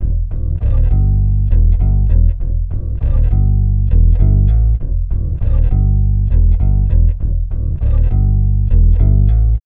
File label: bass